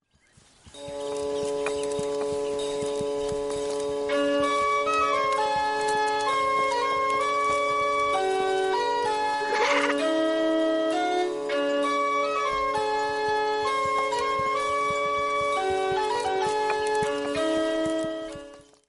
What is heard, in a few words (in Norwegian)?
folketoneinspirert musikk